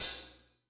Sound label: hihat